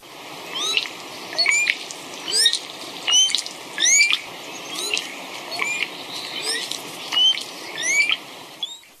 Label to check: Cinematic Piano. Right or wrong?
Wrong